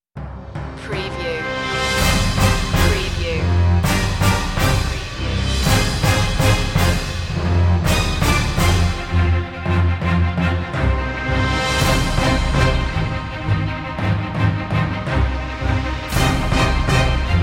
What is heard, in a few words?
sol invictus no choir